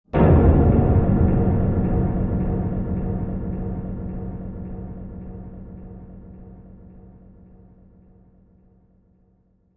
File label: Bass Impact Tonal Be, impacts